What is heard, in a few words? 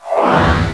demorph; Odyssey